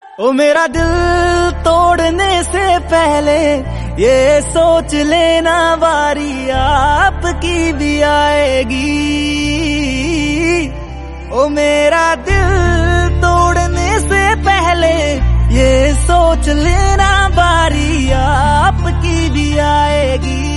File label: Sad Punjabi Song